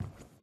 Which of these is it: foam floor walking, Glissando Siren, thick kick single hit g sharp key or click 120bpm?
foam floor walking